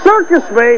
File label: circus